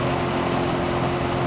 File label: vent; Mafie 1